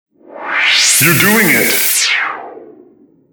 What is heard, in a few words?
Air Med